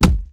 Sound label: Obstacle Collision Entity; backrooms 2d android ios online windows gamerip